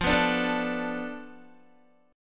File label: F 6th Flat 5th F Guitar; F6b5 strummed